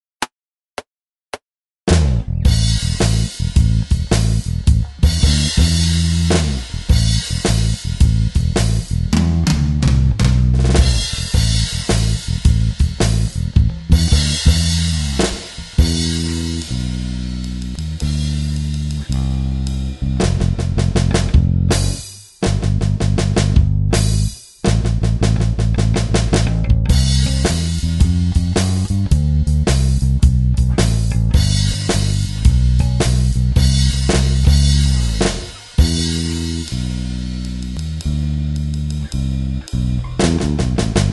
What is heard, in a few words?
Blues Backing Track